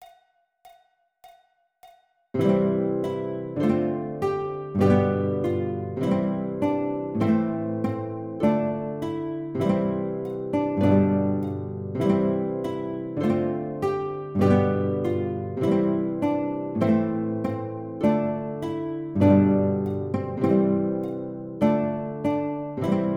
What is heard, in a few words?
Ode To Joy Guitar